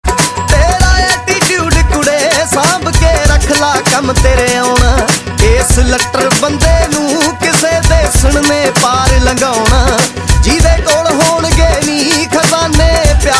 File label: Punjabi Bhangra Tones; Ringtones